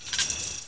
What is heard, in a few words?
Scolar Breathe